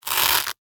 Crunch Floral Foam, Texture, Crunch, Short, Floral, Foam; Rip, Tear; Blast Wave